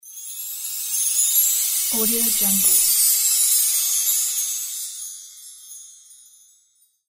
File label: magic chimes